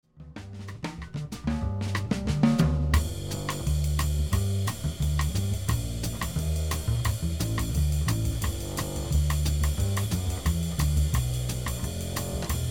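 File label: bass drums only at melody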